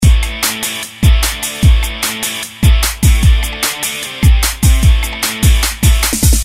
rhythm electric guitar loop; Game loop rhytm; Sound Graph

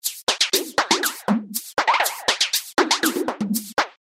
rhythm loop Elastic flanger; Sound Graph

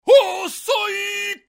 oooshoy voice sound effect karate figth manga; free videogame sound; Sound Graph

